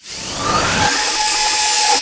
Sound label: ship tail open; Earth and Beyond; EA Games